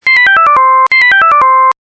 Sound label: Fall Rate Attentive; dexcom g4 platinum